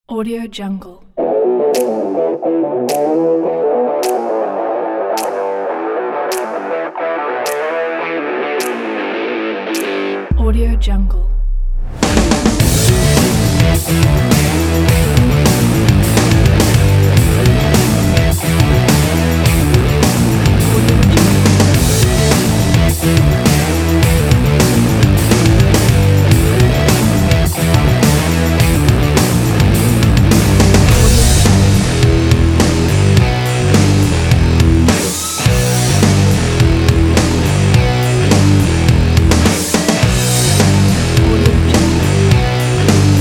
grunge guitar riff

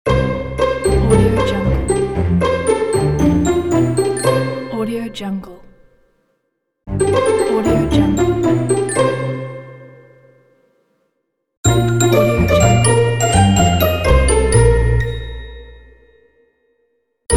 cartoon kids logo pack

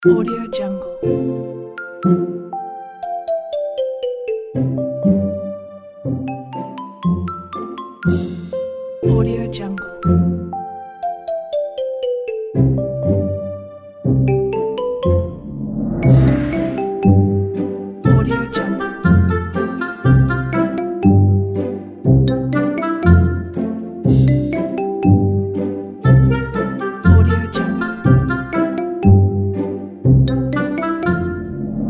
spring break day

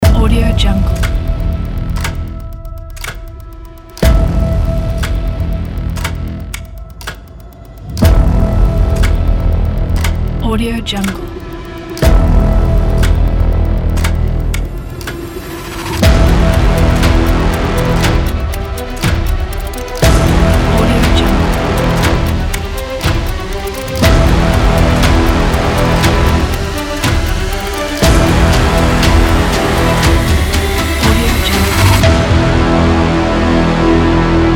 trailer intro

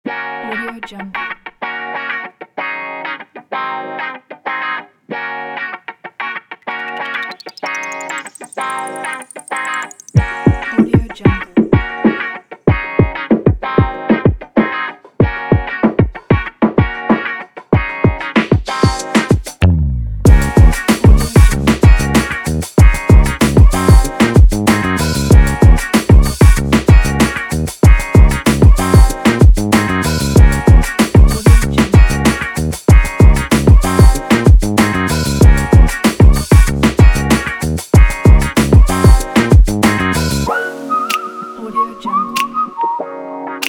funky hip hop; friendlysound

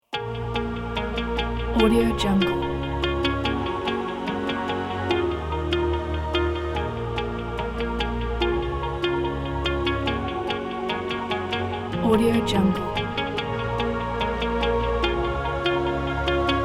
epic metal

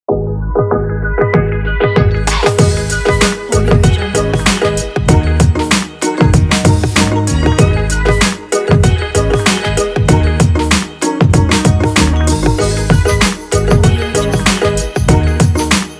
vlog

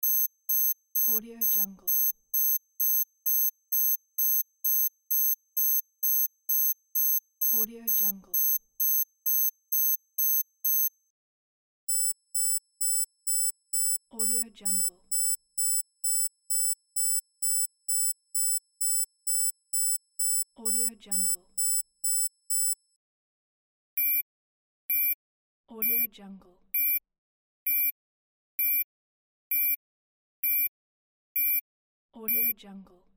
military tracking signal

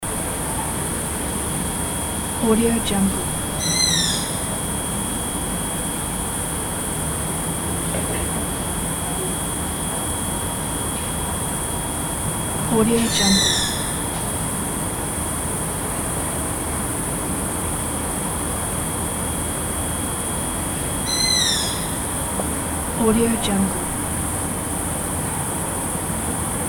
cicadas

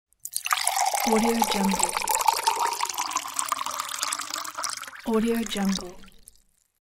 liquid pour into glass

